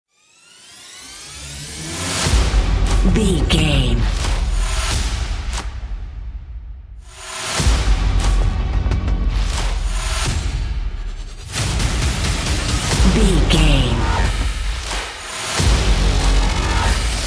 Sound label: action industrial hybrid trailer